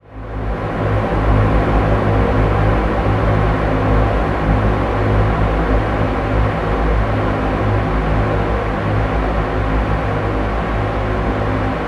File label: Atmosphere G# 145 BPM, Psytrance; Temple Run